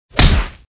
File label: punch